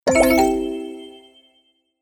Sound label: video finished; 1casino-plinko-x